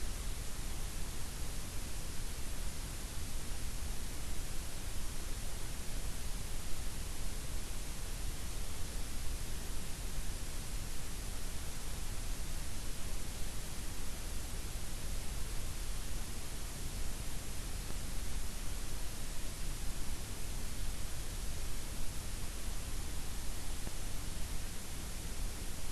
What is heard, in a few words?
WFR audio emfisis